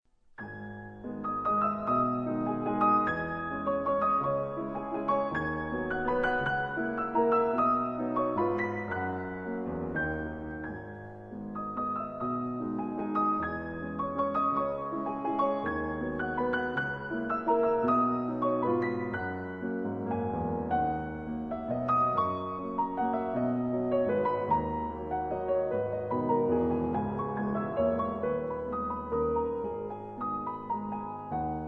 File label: waltz